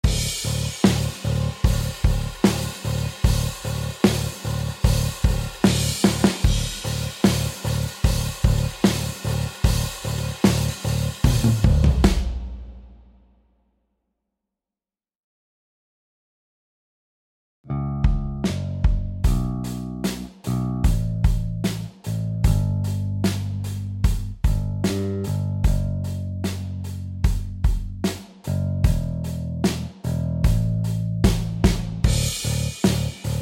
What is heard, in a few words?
drum n bass